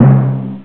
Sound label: Timpani; Beyond HyperCard